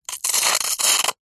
hospital syringe squirt thick gel like liquid

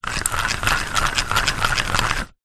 leisure fishing reel turning reeling small; zapsplat

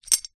bottle tops beer metal caps movement hand; zapsplat

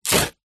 card cereal box rip; foley

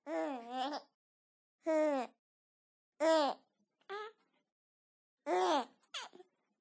six months old baby boy laughing; Sound Ex Machina

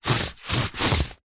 foley cardboard pieces x2 rub together

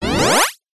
science fiction beam me up almost cartoon like

